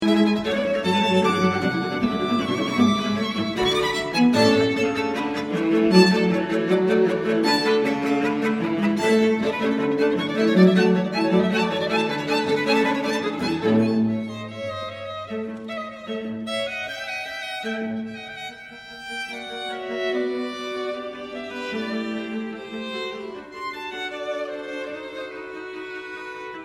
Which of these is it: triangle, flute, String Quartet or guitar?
String Quartet